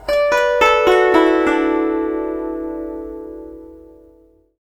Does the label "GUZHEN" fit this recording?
Yes